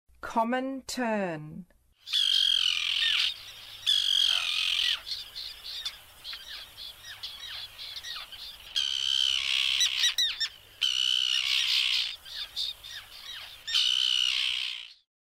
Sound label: Common Tern, Songs of Birds of North America